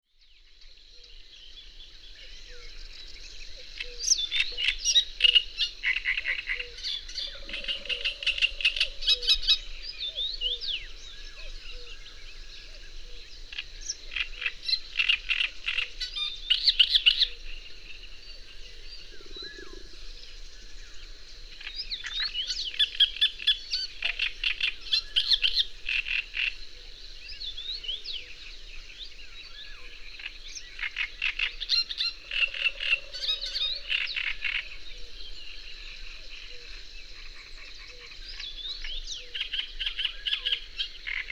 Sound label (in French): Rousserolle turdoide Acrocephalus arundinaceus, le chant de la rousserole turdoïde; Grand Site Concors Sainte-Victoire